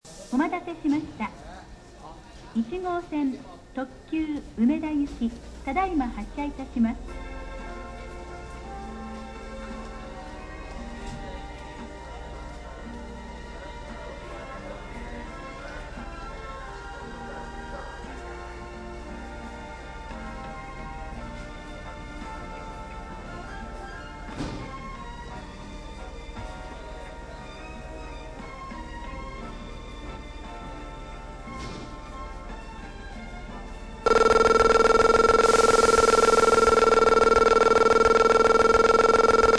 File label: bell, station; 1号線発車ベル; 阪急梅田駅